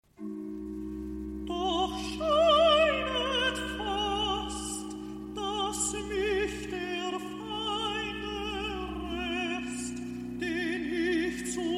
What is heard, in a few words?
Recitativo; Halt im Gedächtnis Jesum Christ, BWV 67; Doch scheinet fast; Karl Richter; Bach